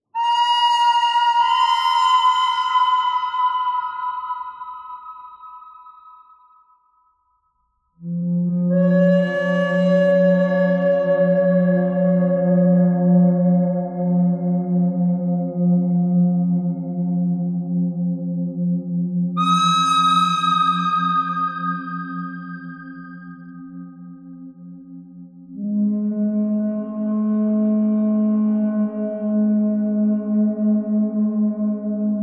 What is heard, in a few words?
bird thread harmonic